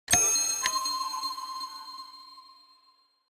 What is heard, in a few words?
One Click, notifications; Samsung; Galaxy S2